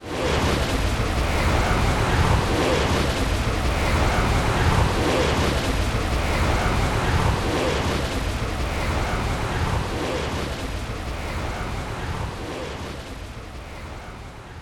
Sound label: Airdrop Loop